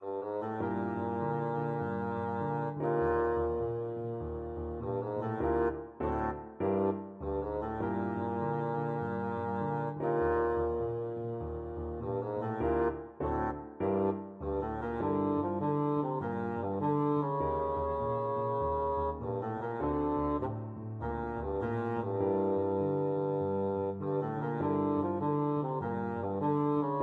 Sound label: minor mooch, bassoon